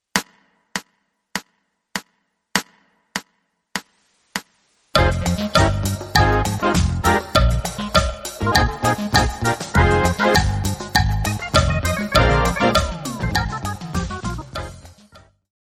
2 handed Medium; My First Rhythm Cup Explorations

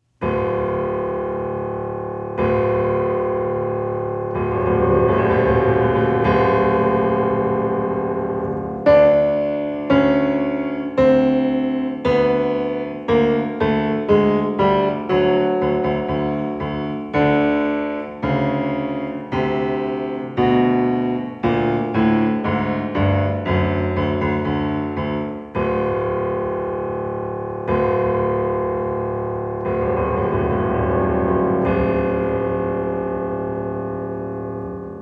African Drum

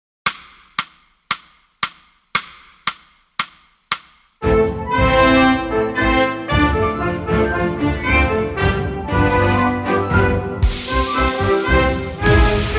Dramatic

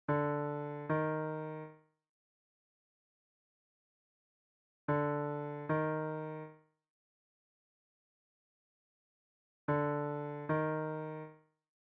Half Steps Example

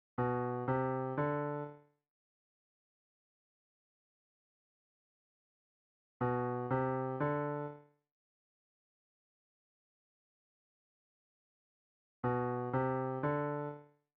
bass b c d

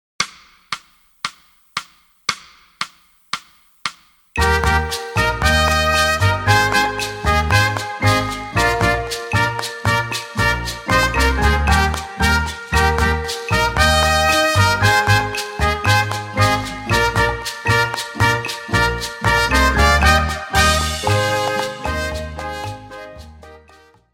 Calypso

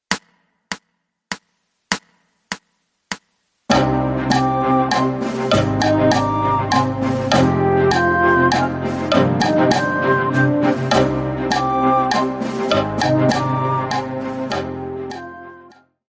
2 handed Medium

